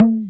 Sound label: talking drum; ETHNIC and WORLD PERCUSSION